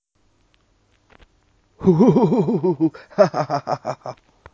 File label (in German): lachen; kinderspiele1